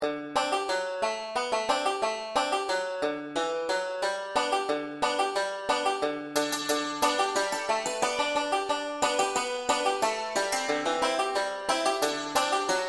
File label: wabash cannonball w melody